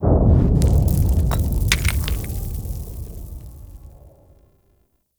Town Stone Debris, Ambience; Warframe; Duviri

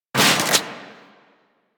Crossbow Shot, weapons; Garuda

